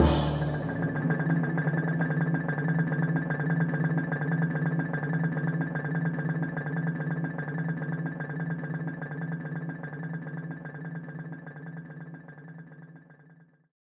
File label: Drum Roll (Party)